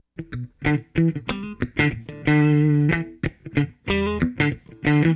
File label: Mixed Berry Jam Guitar, 70s Analog Funk; Sonic Foundry (Sony Creative Software) - What it Is! 70s Analog Funk